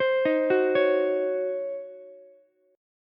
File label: Db Major 7th Suspended 2nd Suspended 4th, Db, Ukulele; 17,15,14,16; DbM7sus2sus4 arpeggiated; JGuitar